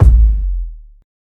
rich low end bass drum single shot a key; kick

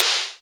rich top end acoustic snare b key; snare drum samples

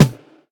snare sample c sharp key; hits one shots

